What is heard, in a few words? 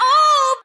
"oh", sung; Voice